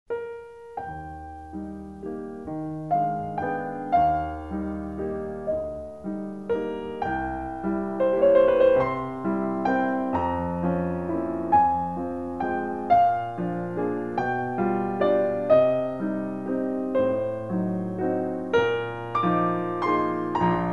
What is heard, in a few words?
nocturne in e flat major; schubert